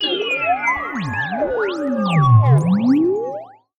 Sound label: defeat, futuristic; lila